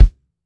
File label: SWUNG KICK; ONE SHOTS; KICKS; breaks; Basement drums vol 2